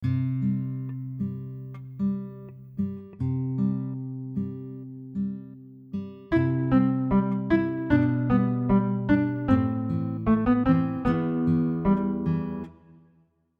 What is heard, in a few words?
artacademy bgm head 76bpm CMajEMajMin 16beats